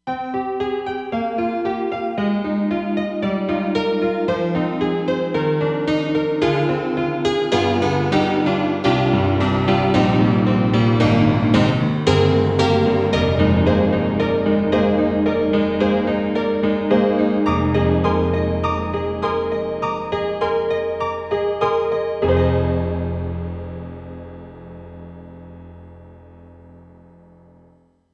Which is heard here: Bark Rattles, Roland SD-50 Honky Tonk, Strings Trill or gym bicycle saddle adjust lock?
Roland SD-50 Honky Tonk